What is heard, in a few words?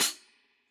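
closed hi-hat; eddyflux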